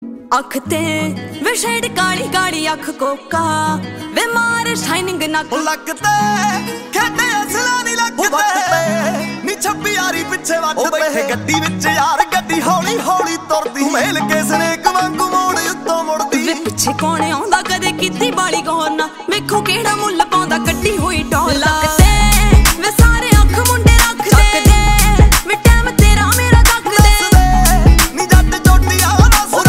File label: Ringtone